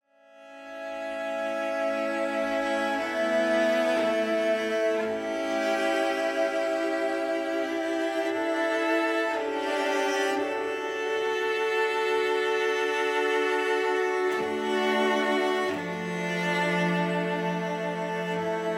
Exploration Strings; The Music of Horizon Zero Dawn

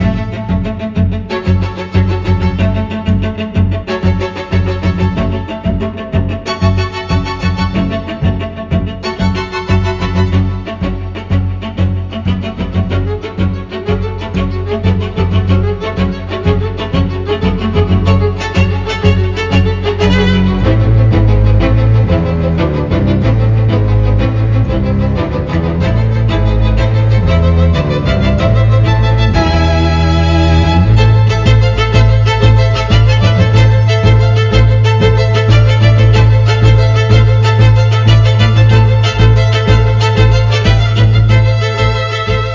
BGM battle

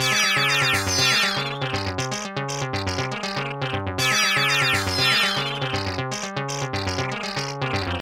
Kyoto A - Cartoon

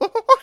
Monkey Idle; Angry Birds Rio

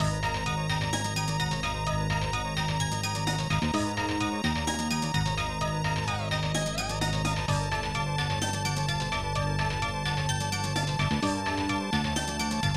Major Battle

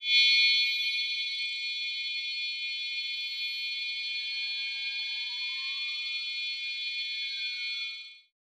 Shine Loop; Se Shine Loop

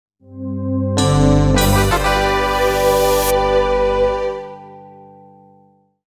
Win - Congratulations

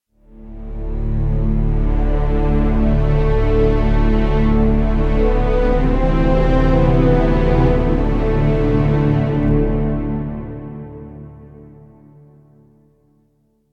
Recruit String; Bog Avvar

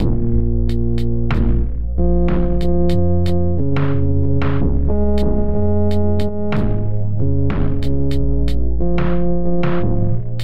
loop

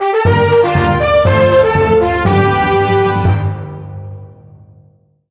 Jingle Game Clear Short; 1000m Zombie Escape; 3DS

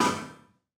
metal hit

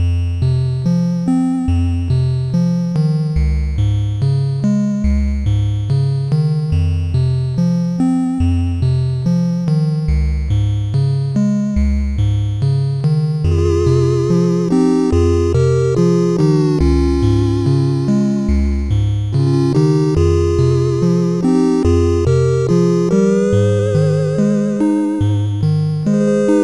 High Score; battle squadron; amiga

